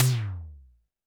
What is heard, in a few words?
TD-20 Tom B, Roland TD-20 Toms